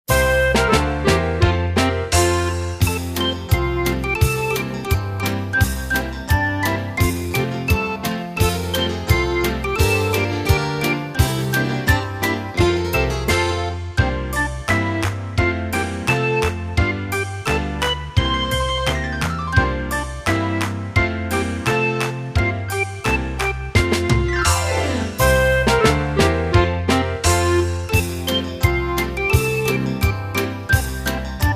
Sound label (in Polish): Polka fasolka akompaniament